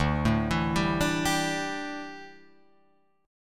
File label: D Suspended 2nd Suspended 4th, Guitar, Open F, chord; arpeggiated